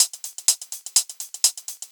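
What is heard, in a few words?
hihat loop 125 bpm, Percussion Loops, Hihat & Filter; Houseworx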